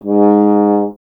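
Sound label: brass French horn; Miroslav Vitous; Solo Instruments VOL-2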